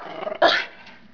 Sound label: ahem